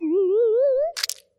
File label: heartbreak emote; animal crossing